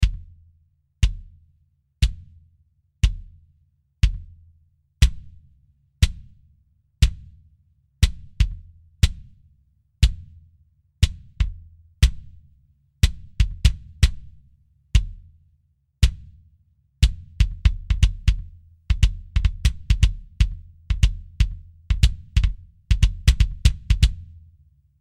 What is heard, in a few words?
kickdrum mattertone off